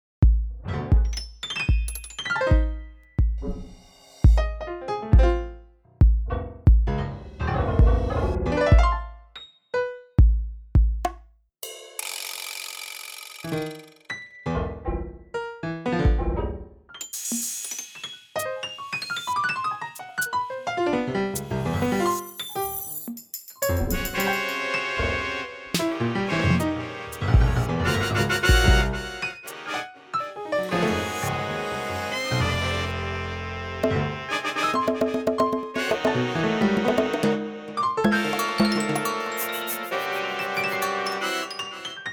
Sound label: new loops; Downloaded some new loops, get a new track